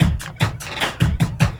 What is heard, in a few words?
LOOP, Drum & Bass, 150 BPM